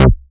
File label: Synth Shot; Vengeance Samples